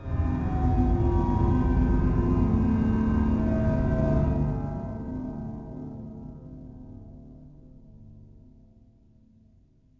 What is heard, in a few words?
atmo church organ outside; Haunted